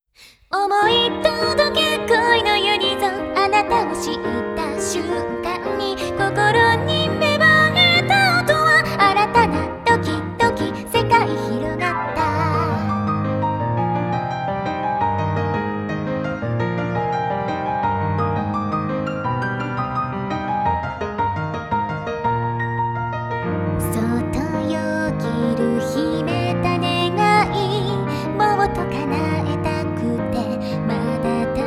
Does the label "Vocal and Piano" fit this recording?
Yes